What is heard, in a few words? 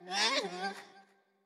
Wimp Aggro